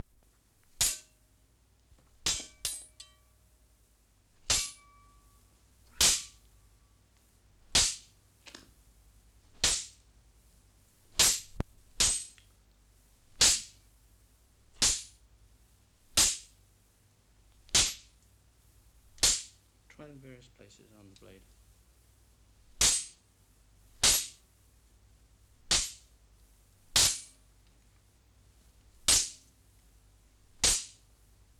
Swords Taps And Hits Metal

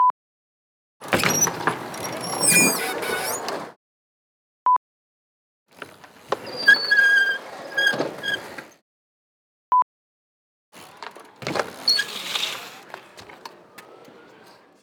Outdoor Brake Sounds

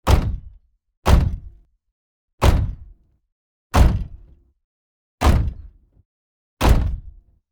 Heavy Robot Footstep; TF Cyberverse; Recreation

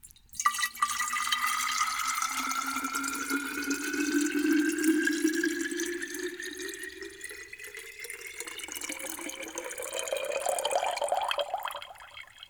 Water Pour Into Jar

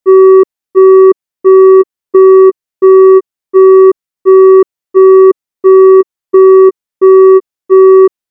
Telephone Busy Signal

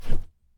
Folder Whoosh

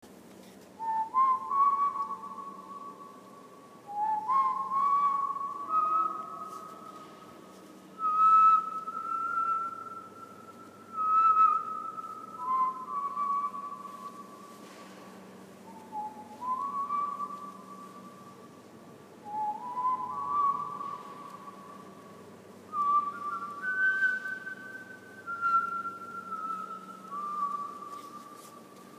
Ominous Whistling

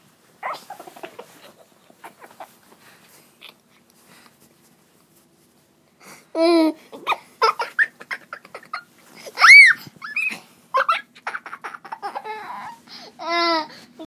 Todler Laughing

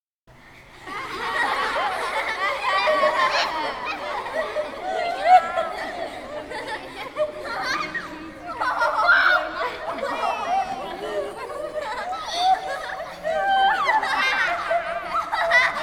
Kids Laughing

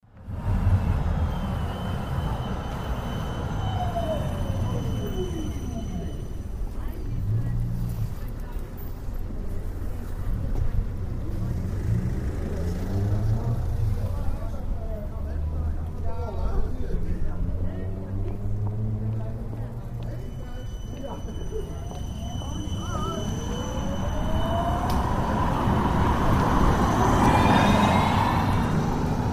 Streetcar

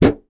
Comp Cover

